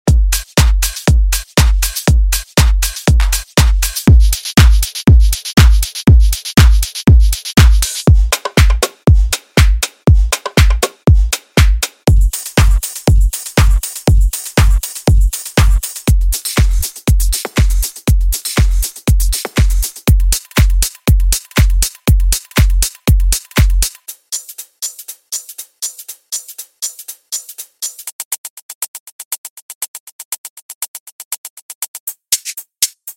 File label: summer pop house